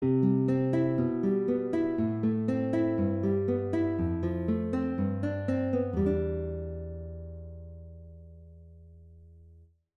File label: concert guitar